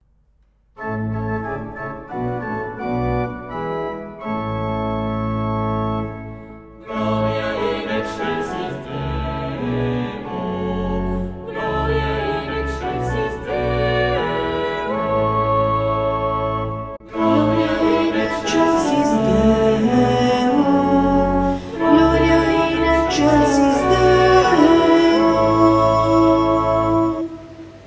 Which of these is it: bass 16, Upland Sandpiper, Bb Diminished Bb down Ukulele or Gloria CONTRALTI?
Gloria CONTRALTI